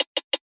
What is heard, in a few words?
hats oneshot, Hat; Pop; Drums; Slate; SD PASADENA Pop Sample Pack